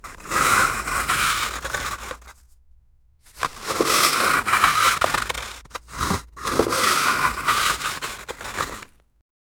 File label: large box sliding on icy, winter